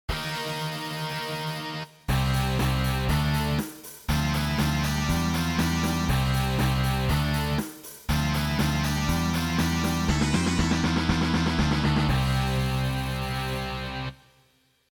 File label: test rock